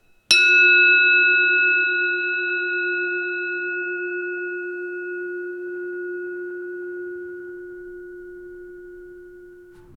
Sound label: zen singing bowl